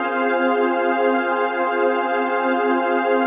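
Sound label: chord pad, Pads; Best Service; XX Large - Pads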